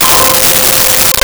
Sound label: Shakuhachi C5; Nintendo; July 2020 Gigaleak